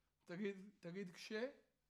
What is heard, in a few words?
TB GTR